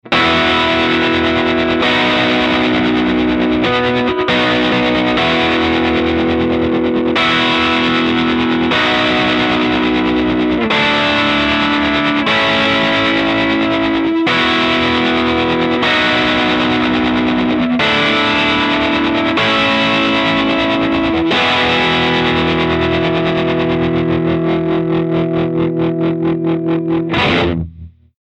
trem double time dirt, tremolo; diamond pedals

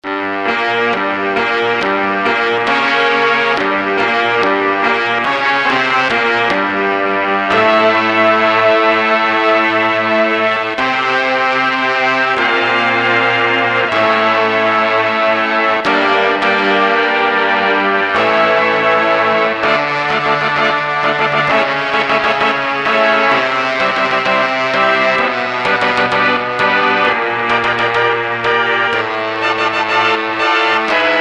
memotron brass vintage; manikin electronic

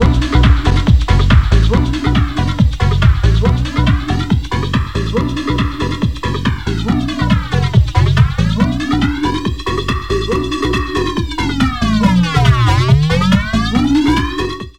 BBD 1024 Flange LFO Feedback; doepfer a-188-1

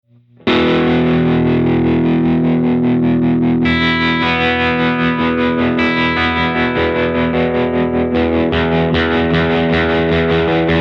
trem e is the key, tremolo; diamond pedals

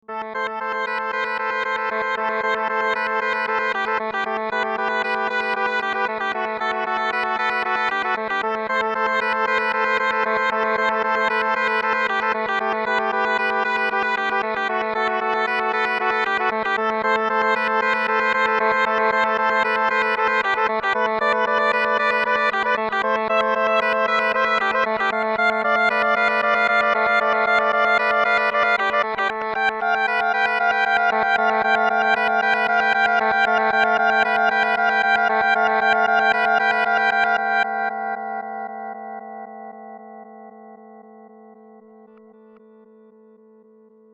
reverse cool, super delay; empress effects

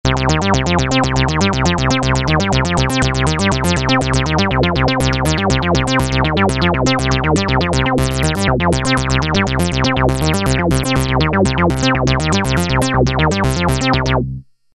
RANDOM STEP; mfb synthII

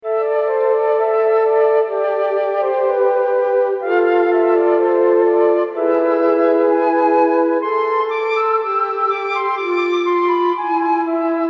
memotron flute studio; manikin electronic

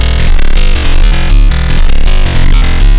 BBD Karplus; doepfer a-188-1

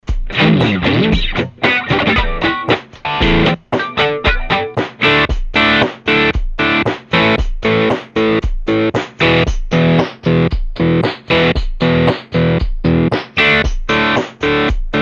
trem chop chop, tremolo; diamond pedals

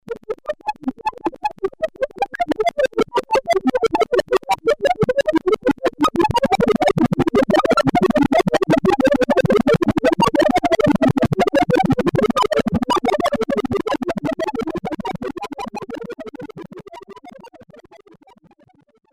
vcf alone; subconscious communications model53; no inp vcf as sound source

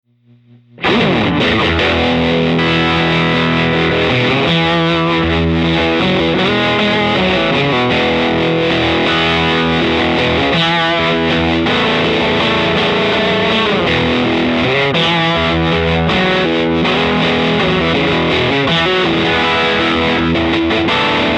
trem slow dirt jam, tremolo; diamond pedals

